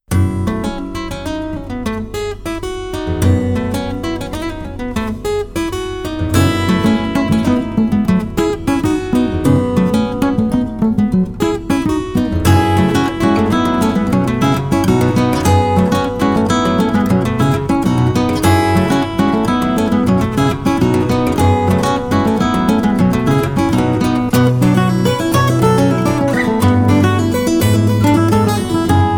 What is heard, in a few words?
Samba In 10